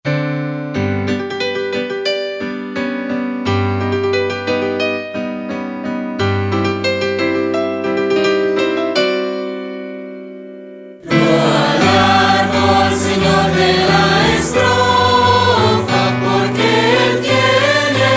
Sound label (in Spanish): Himno al arbol